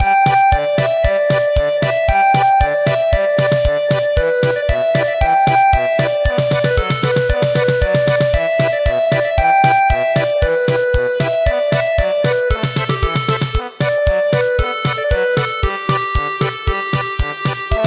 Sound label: German Polka, midi traditional